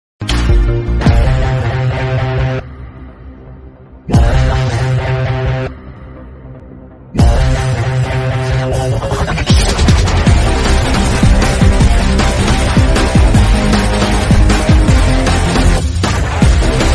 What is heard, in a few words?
movie background music mass bgm; thuppakki